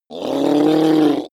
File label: reptile dying choke